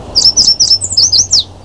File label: yellow warb; natureNavigator